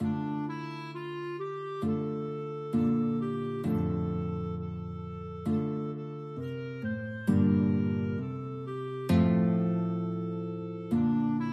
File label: let all mortal flesh guitar clarinet, guitar clarinet duet